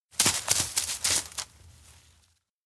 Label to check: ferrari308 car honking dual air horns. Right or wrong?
Wrong